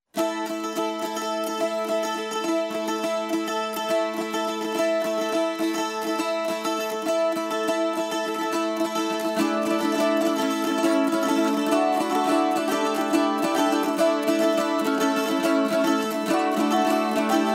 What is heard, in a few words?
Mandolins Sing